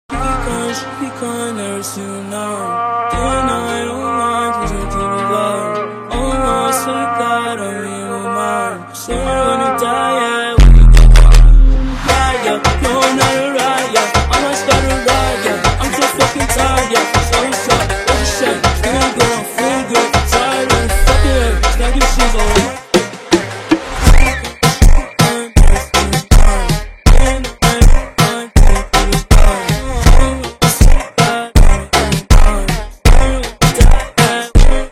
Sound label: FUNK Super Slowed